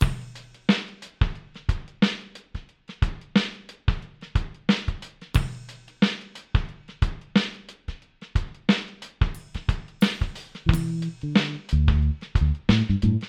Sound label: organ